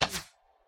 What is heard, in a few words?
minion bow shoot; smite; npc; roman archer